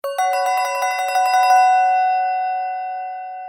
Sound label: Ring Msg